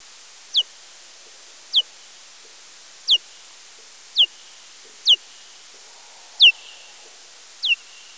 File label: Eptesicus serotinus